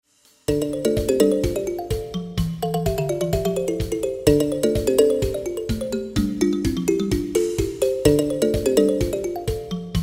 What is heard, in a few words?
funky monkey, marimba